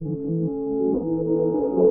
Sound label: Slow Scrub; Inspired by Nature by Dillon Bastan; Emit Presets